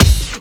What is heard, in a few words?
BD hip, Dance Mega Drums; Best Service